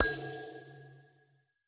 死亡声; 末影之眼

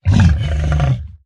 僵尸疣猪兽愤怒声

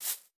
竹笋击打声

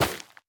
下界疣破坏声

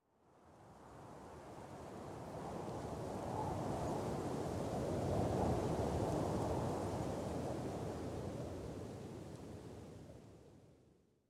灵魂沙峡谷氛围声; ambient

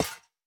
刷怪笼踩踏声

